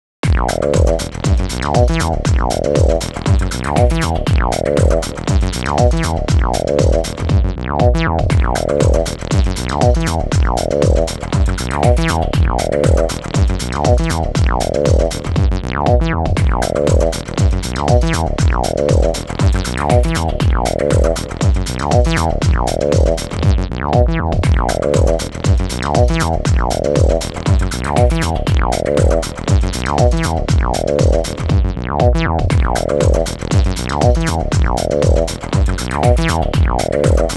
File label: Synth Bass